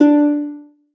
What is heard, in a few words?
lyre